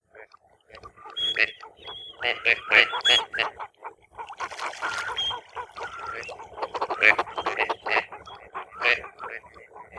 CANAPIGLIA

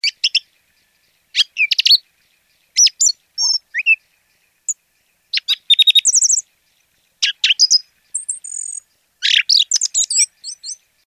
TORDO BT, birdsound

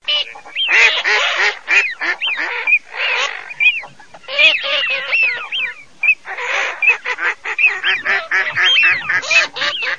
GERMANO ALZ FEM FISCHIONE

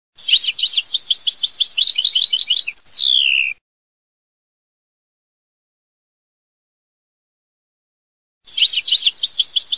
CARDELLINO

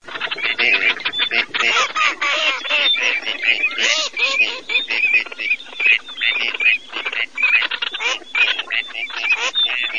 ALZAVOLA CANAPIGLIE

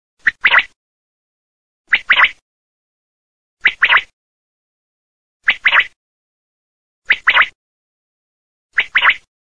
QUAGLIA MASCHIO

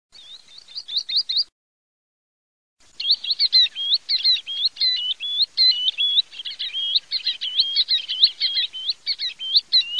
PIRO PIRO CULBIANCO

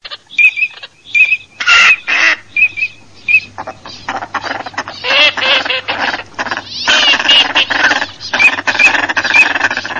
alzav cod, birdsound

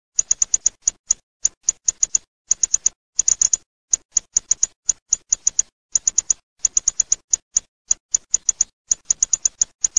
FROSONE

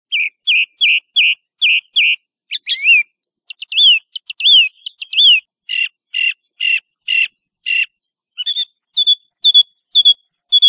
Tordo bottaccio

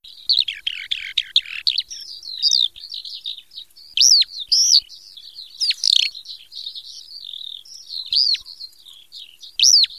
allodola femm

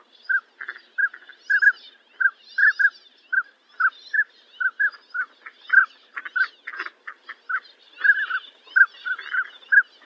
Codone

